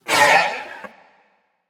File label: nv sigh; smite; xbalanque